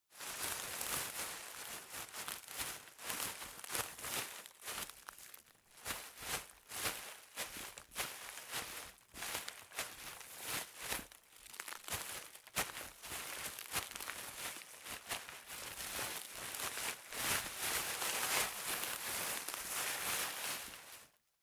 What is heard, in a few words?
Plants and Undergrowth Being Viciously Rustled; audiopostproduction